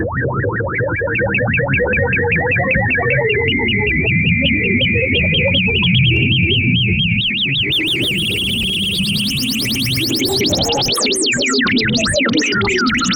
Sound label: create a sound ufo commun, alien language